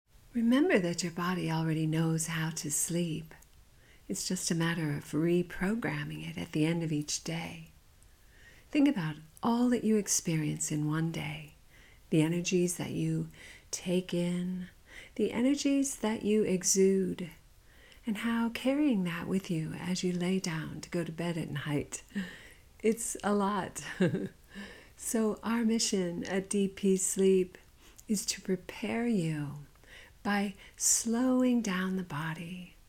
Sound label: Deep Peace Sleep, meditation